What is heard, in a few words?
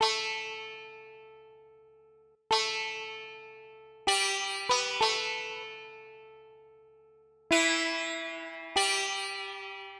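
Sitar B, Hip Hop Exotica, 096 A minor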